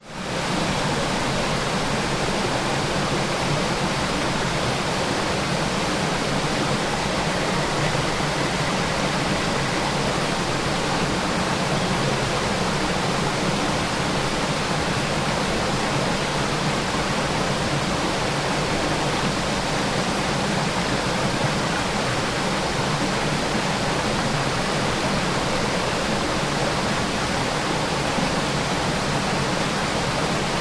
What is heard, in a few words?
nature waterfall med large 20m distance creek close by